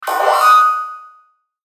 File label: Hit High Pitch, Hit High Pitched Metal Ring; Blastwave